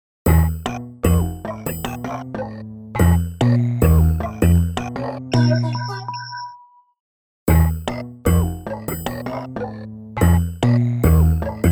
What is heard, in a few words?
Honky Funk plus; Pattern with various sounds, bass is from Prepared Rhodes